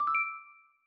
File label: suggestions Opened; NVDA; Cubic